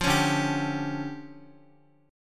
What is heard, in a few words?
D Minor Major 11th, down, Guitar; DmM11 strummed; JGuitar